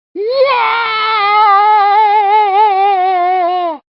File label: fall